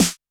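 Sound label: Electro Kit Snare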